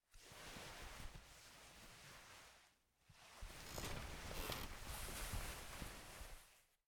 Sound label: Get Up; LOOPERS